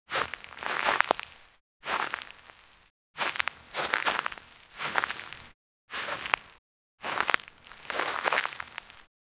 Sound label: realistic footsteps on dr, sand